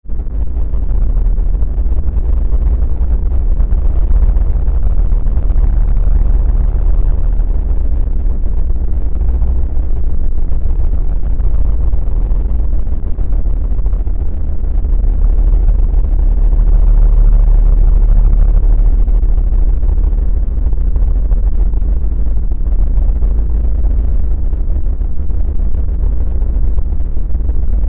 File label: Bed Short Dark Static Swells; Bed Sound Effects